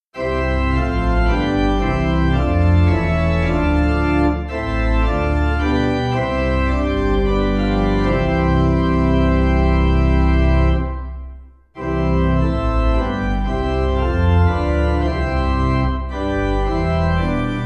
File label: organ, hymn; 5vs; GottSeiDank; Hymnal 1982